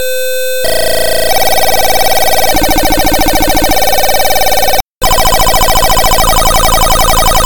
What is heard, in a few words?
arpeggio test